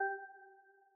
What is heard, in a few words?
warning